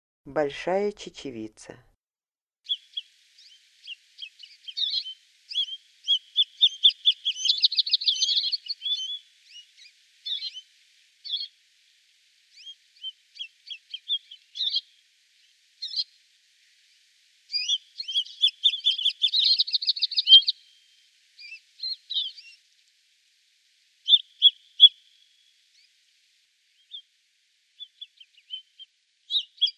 bolshaya chechevica golos

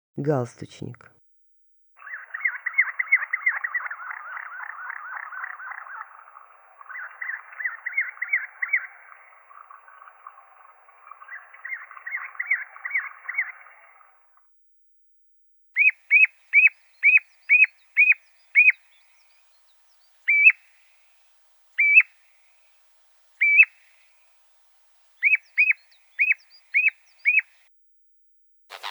galstuchnik golos

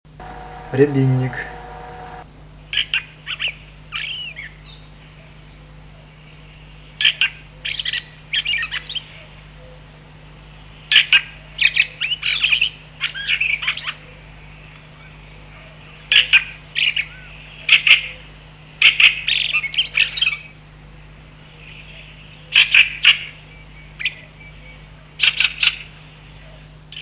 ryabinnik golos

